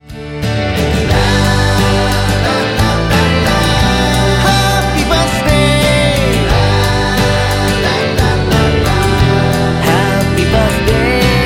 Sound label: happy